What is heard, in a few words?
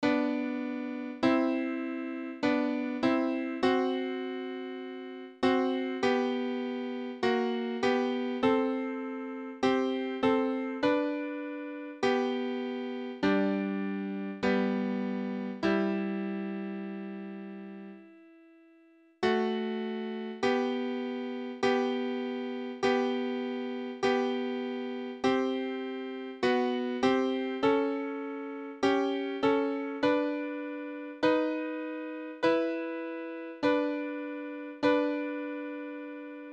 melody lo harmony